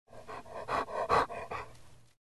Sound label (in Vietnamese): con chó thở mạnh bằng miệng